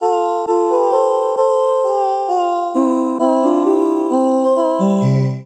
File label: Chromatic Voice F Major 132 bpm; MIDI Clips; Ableton; Factory Packs; Voice Box